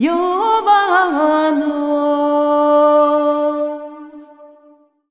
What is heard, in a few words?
VOX, Balkanish Traditions; USB Soundscan vol.63; AKAI